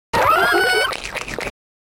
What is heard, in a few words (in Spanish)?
Grito de Gastrodon